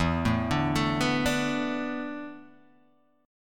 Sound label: E 7th Suspended 4th, Guitar, DADGAD